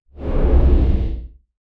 swoosh, air movement

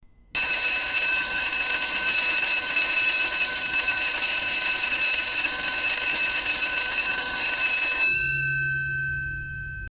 school bell ringing

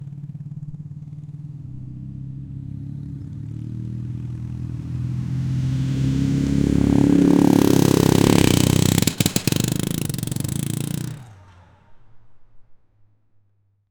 sound of a powerful motor, motorbikes

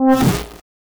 game damage sound; feedback

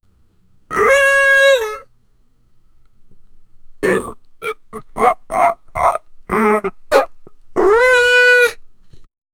jeep sound; automobile

